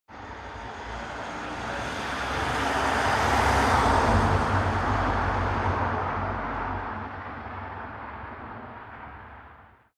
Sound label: Taxi Car Passby Through Tunnel Reverberant London; Transport; Car Sound Effects